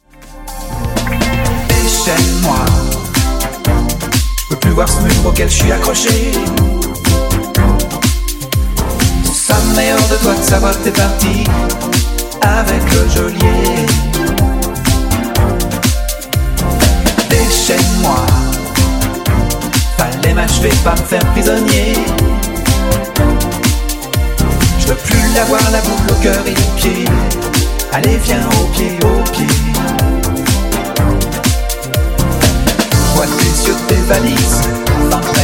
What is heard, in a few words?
Funk Edit 123Bpm